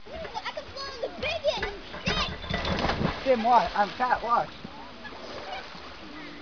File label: people